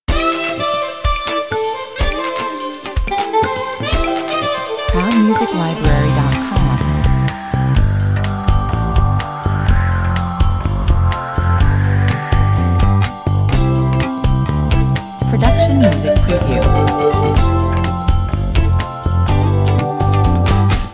silly sax 125 bpm

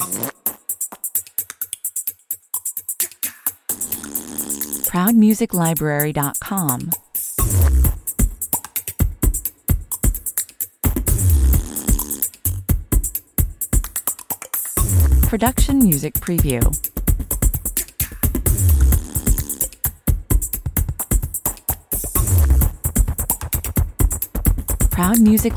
boogie, 130 bpm

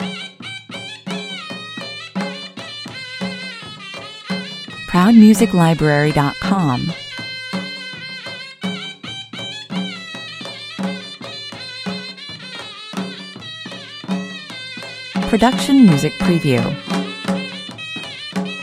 indian village wedding music loop

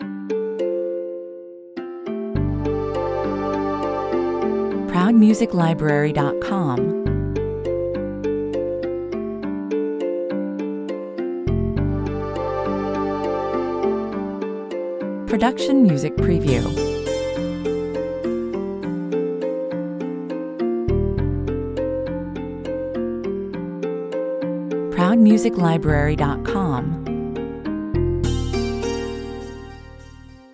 flowing peaceful motion 102 bpm

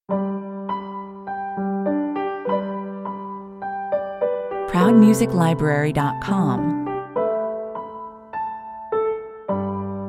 piano and strings

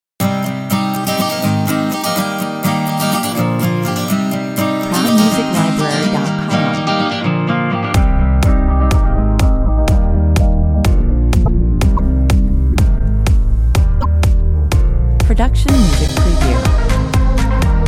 dance 124 bpm

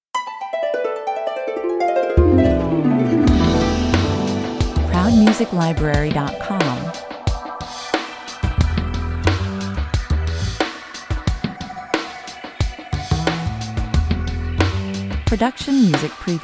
urban smoke 90 bpm

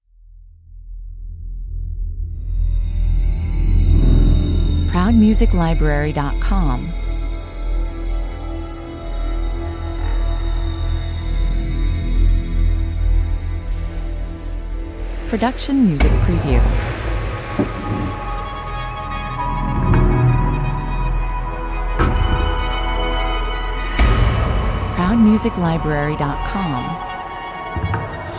without beats 120 bpm

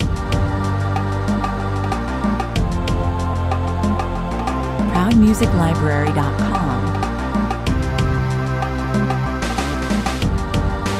jungle, 94 bpm

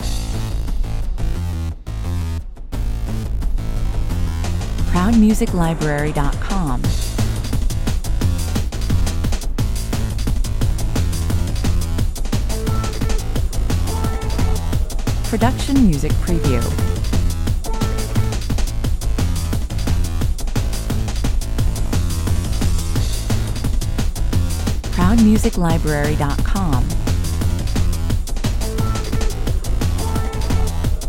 (rhythm only mix) 88 bpm

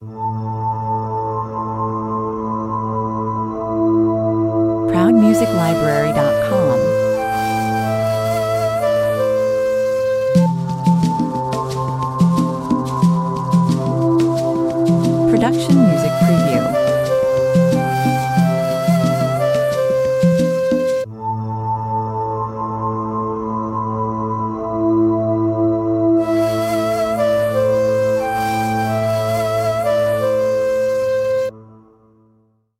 afrikaans 90 bpm

